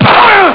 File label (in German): schlag magen; Amiga Soundtracker sample